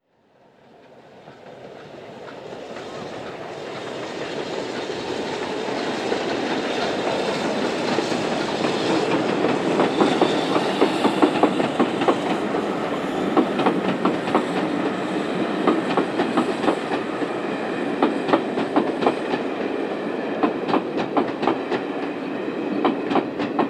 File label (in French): bruit joints de rails; sonotheque